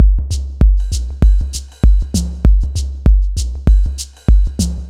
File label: Drum Loops, 98; M8; Electronisounds